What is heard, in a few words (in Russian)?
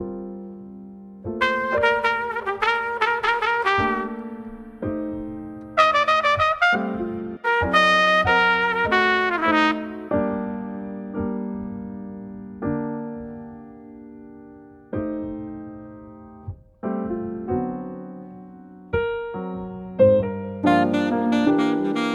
Dzhazovoe trio